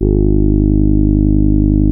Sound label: TAURUS A2, synthesizer